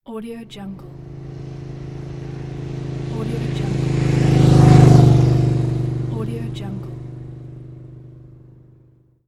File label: motorcycle passes by